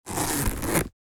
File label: Purse zipper noise open close